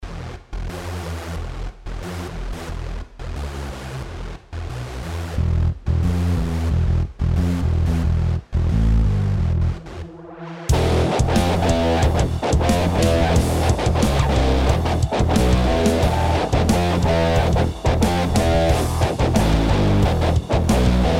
industrial djent metal thrilling distorted rough guitar distorted synth; 180bpm